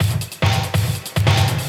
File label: Jungle Drum Loop 142bpm